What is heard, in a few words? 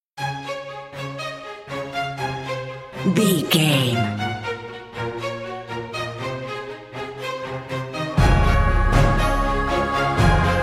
cinematic corporate